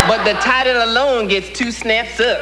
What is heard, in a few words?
Snaps